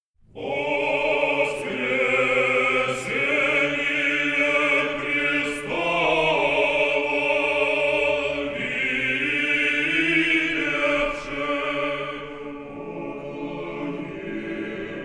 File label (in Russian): Хор Валаамского монастыря